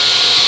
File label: soft saw